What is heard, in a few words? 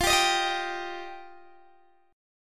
F 7th Suspended 2nd F Guitar DADGAD; F7sus2; strummed; x,20,17,20,18,17